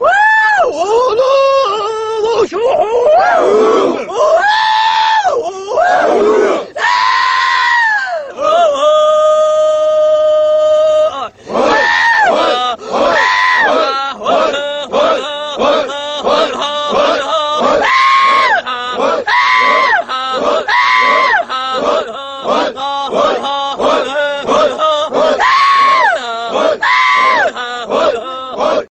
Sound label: Arabic Camel Song